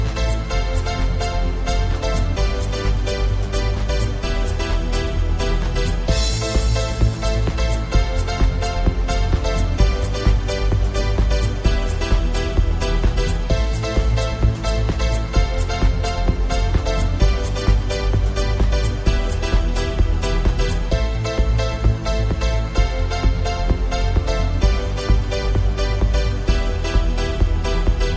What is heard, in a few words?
Beautiful Instrumental Ringtone